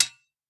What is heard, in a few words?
sword hit